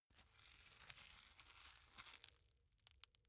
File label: boxing gloves close velcro long; sport freizeit; boxen